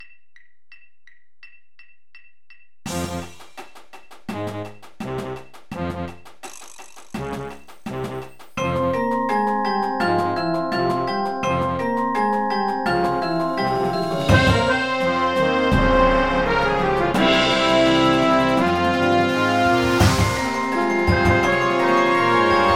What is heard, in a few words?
box music